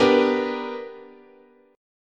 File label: B Minor Major 7th Sharp 5th, chord, guitar